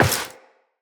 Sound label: Step Metal, Footsteps Walk; Tenno